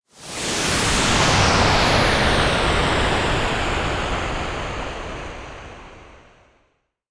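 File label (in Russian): эффект пролета или перехода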